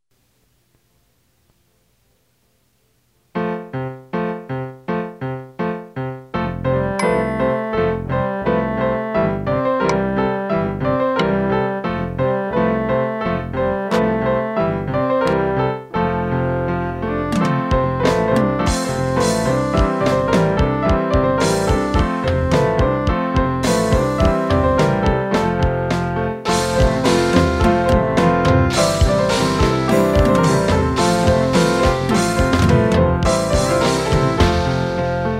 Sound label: Waltz